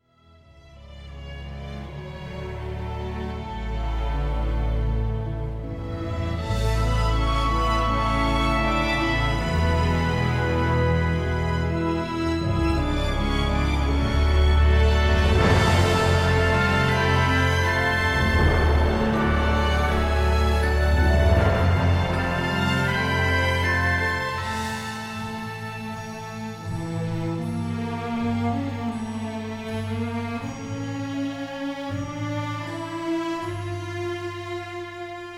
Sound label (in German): Ouvertuere Playback; Tabaluga